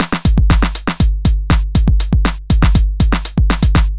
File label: Groove Pizza 120bpm